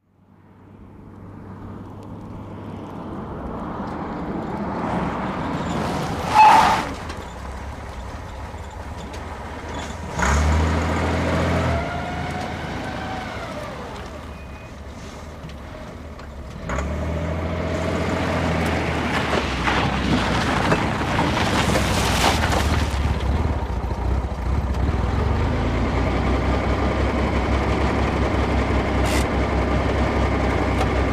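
download Sound, Chevrolet Corvair, In Fast, Hard Stop with Skid, Reverse, Rough Idle, Return To Close Up, Set Parking Brake, Idle, Door Open and Close. Mic Moves Close On Engine, Off.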